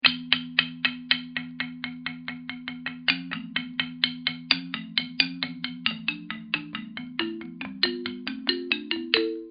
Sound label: marimba